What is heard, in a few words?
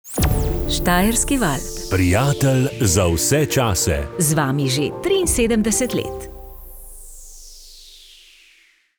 STINGER